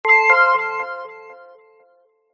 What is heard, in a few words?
multimedia alert chime delay bright positive; zapsplat